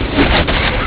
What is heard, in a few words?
laser gun